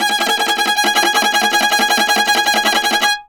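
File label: viola tremolo G#3; Orchestral; DirectWave; Varazdin Orchestral; Viola II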